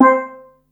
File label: steel drum, Yamaha Motif ES drum machine; 300 Drum Machines; Yamaha Motif ES-Series